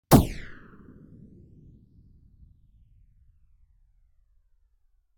Gun Shot Muted Medium; Hollywood Edge; sound designer's toolkit v2

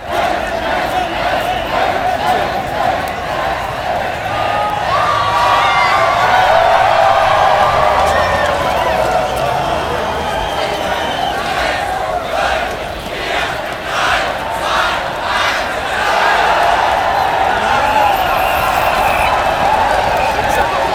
revolution crowd; best service Blue Box

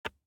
DICE on Hard Wood, Grab, Standard, 1 One Die; Samples; ArticulatedSounds; DICE Sfx Party (Extended)

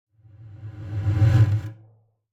Whoosh Reversed Fast Pulsating - Passing; Hollywood Edge; sound designer's toolkit v2

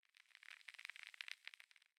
Crackle; Pitch Black - A Detective's Demise

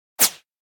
Button Whoosh Sci-Fi Small Air Button Beep; Hollywood Edge; sound designer's toolkit v2

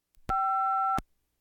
Household Phones

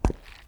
boots wet hard; Apotheorasis

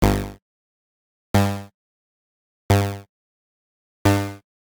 Digital Click Negative

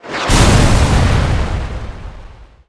Explo Medium Vehicle; Destruction

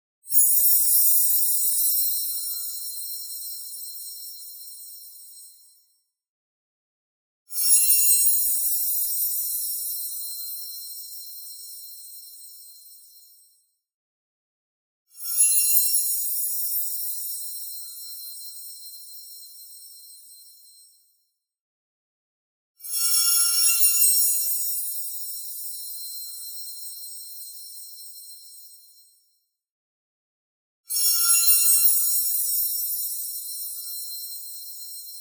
shimmer, noisy high pitch move; boom library; Anime Essentials; DSGNMisc

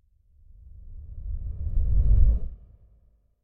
Whoosh Reversed Sub Air; Hollywood Edge; sound designer's toolkit v2

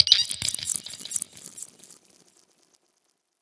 Needle Shots; Plastic Surgery

